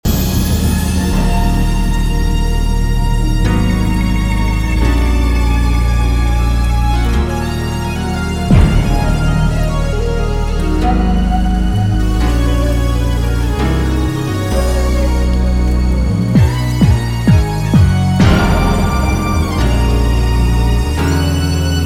Instrumental

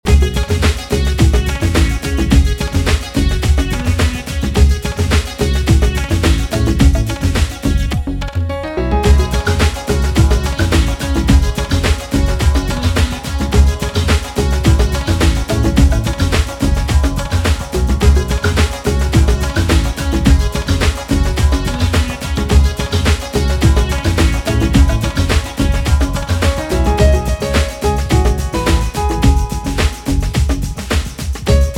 Piano Mix

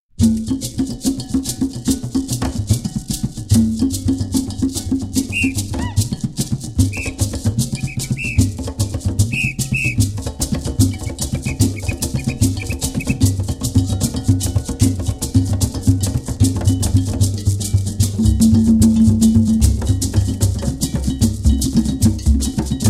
Drumming

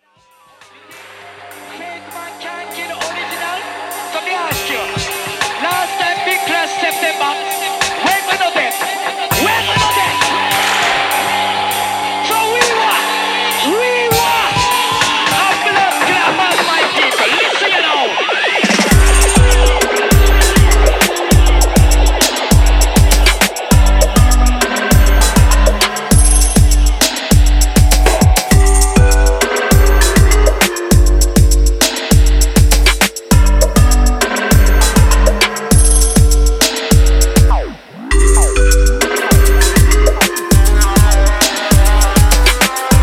Wicked Sitar

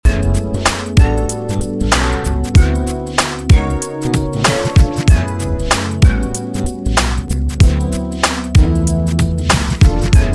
instrumental

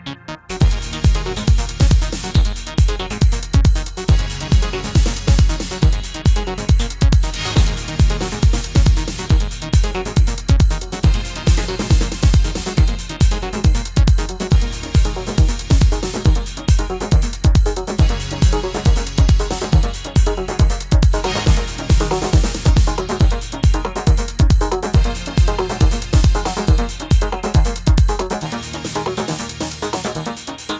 Loop

